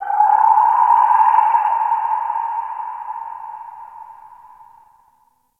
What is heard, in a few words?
Ocean Underwater Creatures Low Medium; Warframe; Grineer Ocean